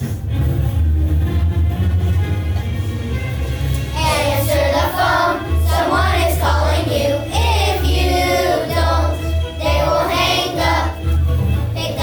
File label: ringtone